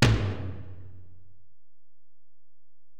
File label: Tom, Alesis DM-PRO